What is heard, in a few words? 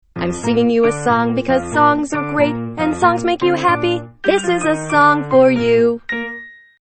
wavenet song